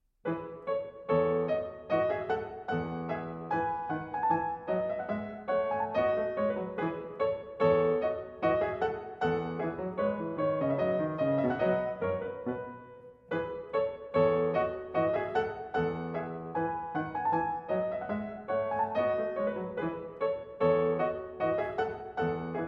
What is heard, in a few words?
French Suite in E, Gavotte; French Suite No.6 in E, BWV 817; Vladimir Ashkenazy; Bach French Suites, BWV 812-817